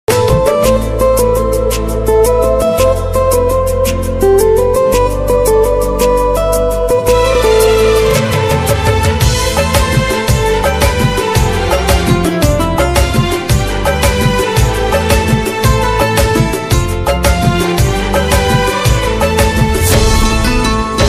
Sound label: odia bgm ringtone; odia song; odia whatsapp status